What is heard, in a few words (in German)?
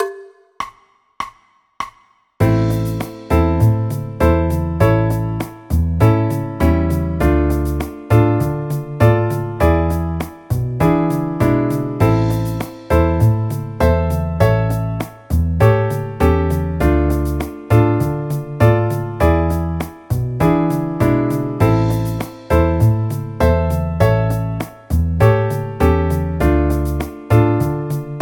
a moll akkordverbindungen